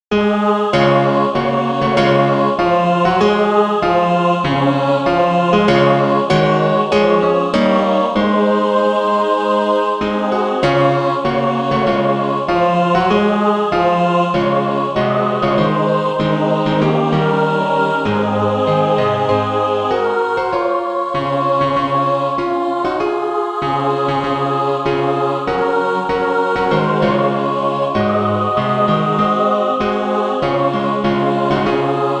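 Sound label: mixed, Practise Music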